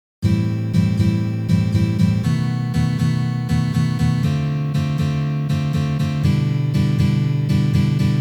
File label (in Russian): Uprazhneniya akkord Am7